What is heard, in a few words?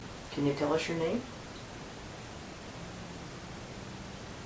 question humming; unaltered